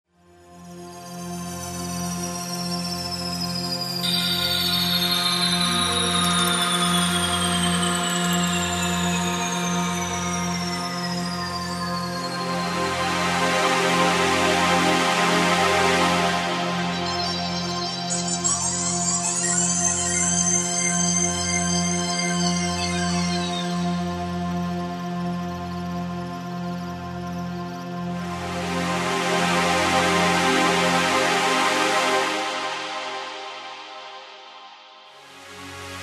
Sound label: Ethnic pads